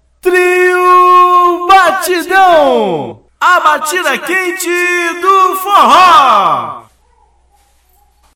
a batida quente do forro

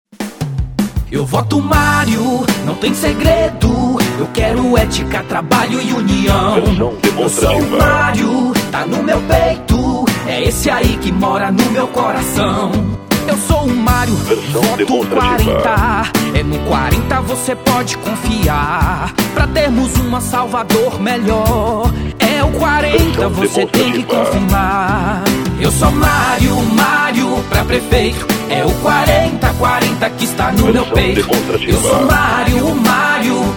jingles políticos

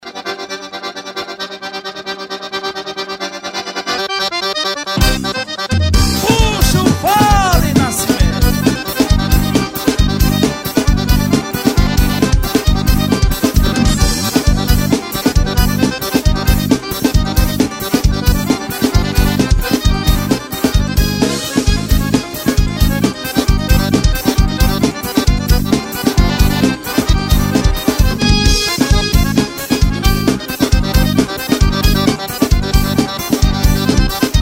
SOLADÃO Instrumental

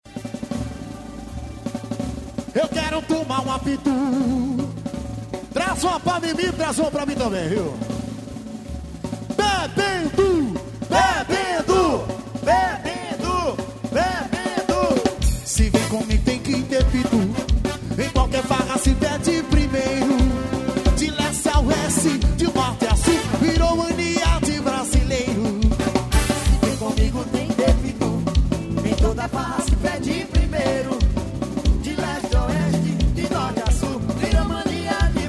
jingle axe; pitu

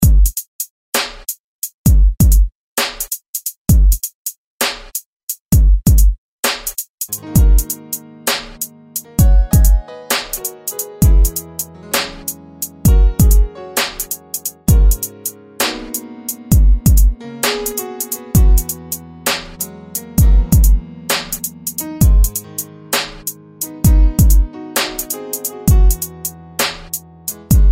instrumental ideia

